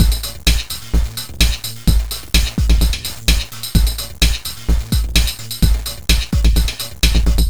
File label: Beat, Drums & Percussion; Electrocution; Beats